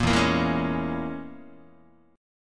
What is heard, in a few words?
E 6th Flat 5th E down Guitar; E6b5 strummed; JGuitar